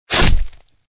pierce, Melee; Weapons

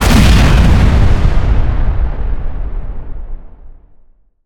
explosion

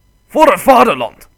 charge

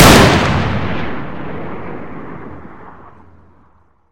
sniper, Gunshots; civ13; civ14; Weapons

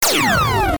laser, Gunshots

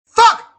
pen voice, vehicle

